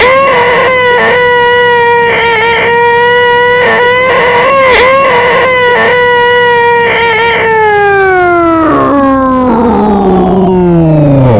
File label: tentacle death; svencoop; cracklife